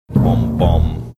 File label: singing bom bom